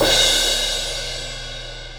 18 inch dark crash; k custom; cymbal; Zildjian

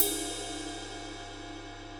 22 inch mellow ride ping; cymbal; signature; Paiste

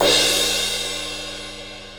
18 inch fast crash crash, cymbal; a custom; Zildjian

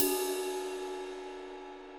22 inch medium thin high ride ping, cymbal; k zildjian constantinople

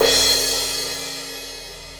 18 inch crash; 2002; cymbal; Paiste

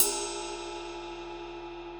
22 inch heavy bell ride; cymbal; mb20; Meinl

